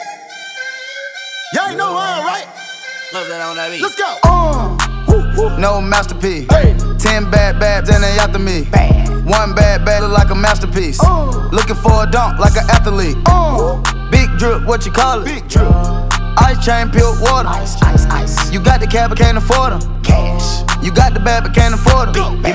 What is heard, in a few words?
Cheer Tryout Music; Jobe MS